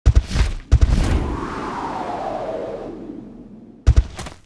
Flying Lion Run; Seer; GamigoNA

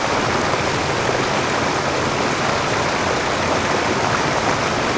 River

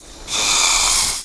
Snake In Run

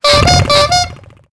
Wedding Car Idle; Seer; GamigoNA

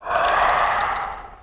Buff Skill

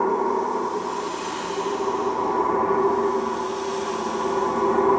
sfx Black smoke; Seer; GamigoNA

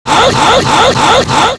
skill casting

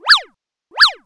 alpaca action

